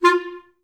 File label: STAC F3, Orchestra; Best Service; Peter Siedlaczek's Advanced Orchestra